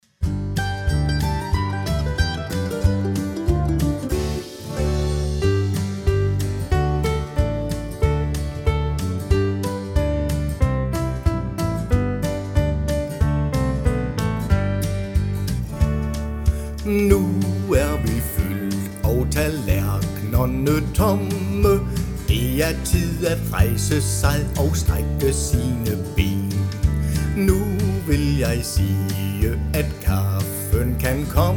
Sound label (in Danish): med sang; Velbekomme; Marken er mejet; 1 vers